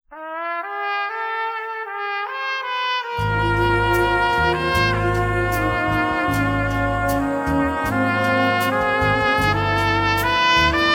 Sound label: Brass On Ivory